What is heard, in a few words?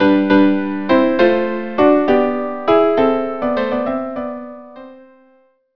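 violin violin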